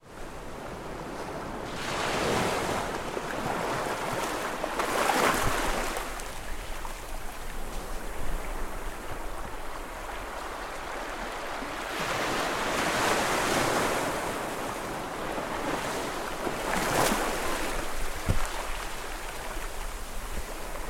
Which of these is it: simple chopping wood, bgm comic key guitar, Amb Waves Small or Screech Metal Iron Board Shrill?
Amb Waves Small